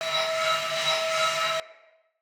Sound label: Sphere Polearm Throw Perfect Loop, Weapons; Player